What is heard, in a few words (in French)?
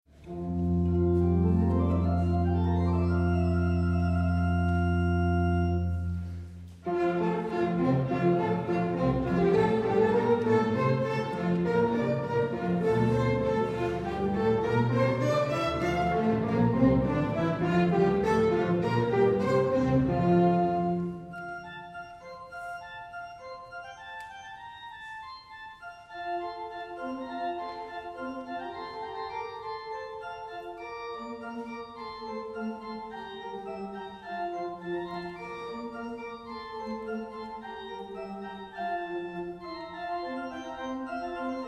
Concerto orgue et orchestre Allegro; Haendel; op 4 n4